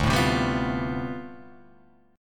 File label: D Minor 13th, chord; Dm13 strummed; JGuitar